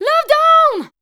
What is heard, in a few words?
voice spectral, ly short